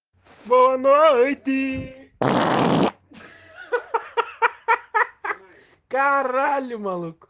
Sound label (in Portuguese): peidu